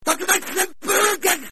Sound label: hurricane kick button